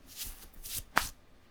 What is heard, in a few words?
clothes; people; Pirates Of The Caribbean